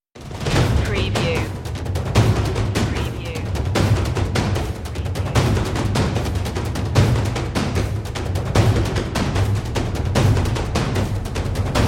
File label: taikos of doom less drums